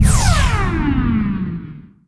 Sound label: pap flux left; Add sounds to new weapons; BO2-Reimagined